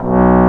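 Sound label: bass trombone; B0; Multisamples; Digital Sound Factory Virtuoso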